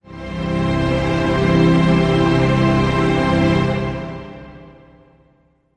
Orchestral Msx Logo

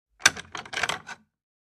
Latch Creaks Rattle; latches & bolts

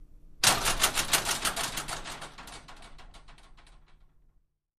Door Accordion Sliding Metal; Shake 3x; Metal Gate

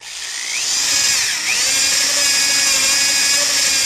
Drill, drills

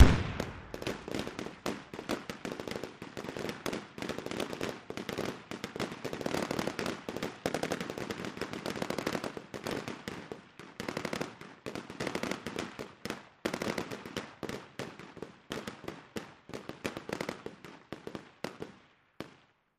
AMBUSH, Artillery Fire Modern War; Ambush 1

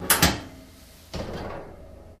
Oven Door Open

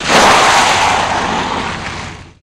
Car Lng Slw Deep Skid D

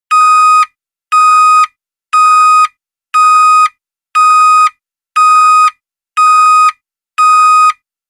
Truck Backup Beeps

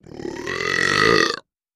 Nasty Stinky Airy B, belching

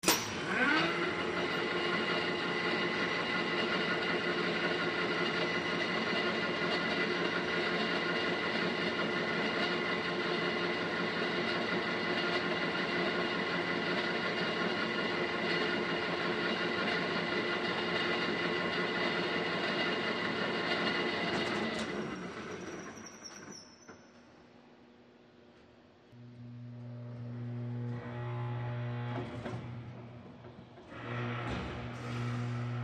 Crane Motor Movement

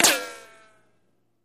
Bullet Ricochet Sin

